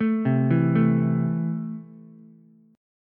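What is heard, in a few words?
A Minor, Ukulele, chord strum; JGuitar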